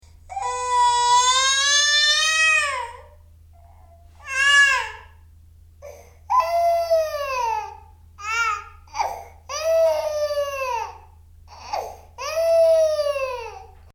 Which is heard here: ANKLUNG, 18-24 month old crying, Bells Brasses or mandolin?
18-24 month old crying